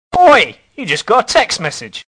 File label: New text message